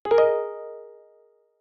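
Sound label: System Warning; Oxygen; plasma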